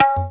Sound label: Tabla Hit; Ambient Grooves